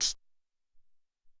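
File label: Noise Hat, Alesis SR-18 Drum Machines; NoizHat2; 300 Drum Machines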